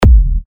TECHKICKS E, techno kicks; Conquest; Revealed Techno Kicks Vol. 10

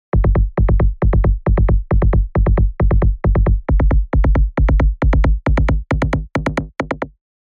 Bassquake, rolling bass; revealed serum 2 rolling bass vol 2

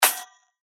FX Hat, cymbals; revealed cymbals vol 1

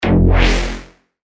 F, bass shots; Steelix; revealed bass shots vol 3

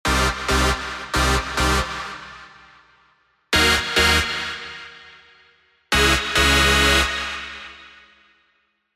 Stab; Devotion; revealed spire mainstage drops

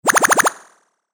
Laser Pew; synth shots tech house; revealed

